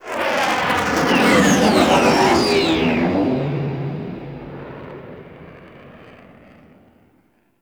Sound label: Ship Fly By; Warframe; The New War; Plains Of Eidolon